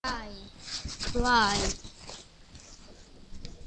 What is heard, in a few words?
cry